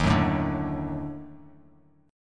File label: D sharp Minor Major 7th Flat 5th D sharp Bass Standard; strummed